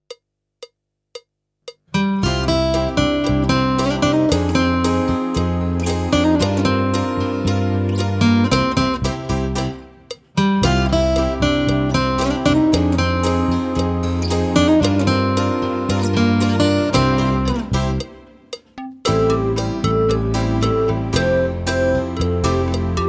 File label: Backing Track; Rock Changes